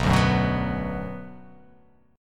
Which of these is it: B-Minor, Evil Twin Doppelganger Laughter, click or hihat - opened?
B-Minor